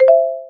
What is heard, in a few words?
Xylo